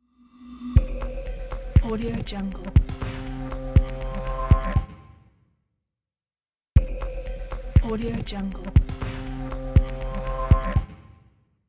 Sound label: tech glitch logo